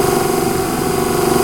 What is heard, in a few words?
Servo Little LOOP